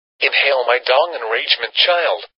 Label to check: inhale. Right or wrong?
Right